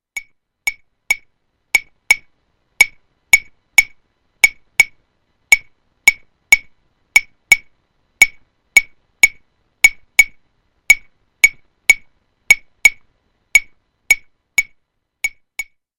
Claves

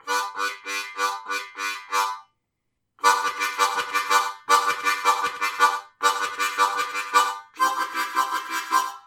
HARP CHUG

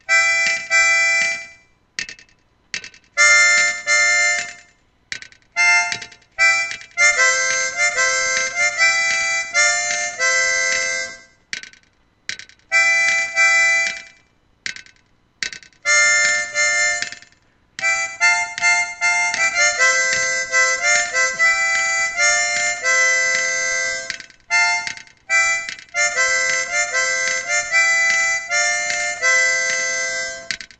Verse Complete; reggae

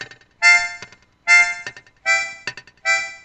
Skank; reggae

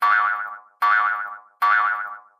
Jews Harp

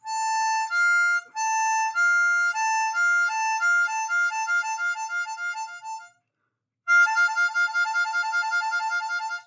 4h 3D 2D Trill; The animals went in two by two

